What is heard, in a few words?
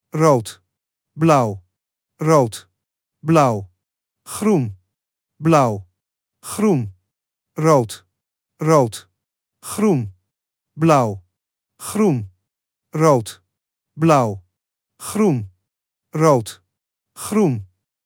Morse; cement